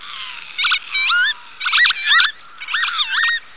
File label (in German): kiebitz